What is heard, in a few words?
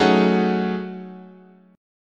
F sharp Minor 6th Add 9th, chord, guitar